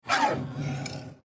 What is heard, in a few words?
Paper Cutter Up; Office; Blastwave